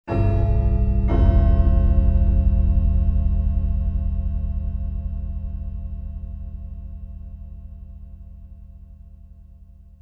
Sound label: dingdong